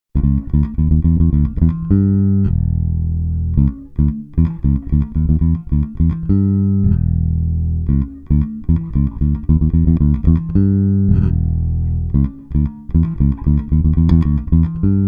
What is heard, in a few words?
JB neck bridge, jbsingle